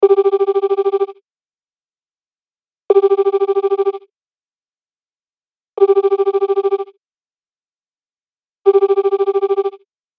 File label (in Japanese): 電話 呼び出し音 4回